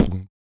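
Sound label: vox percussion, Vocal Planet Jazz FX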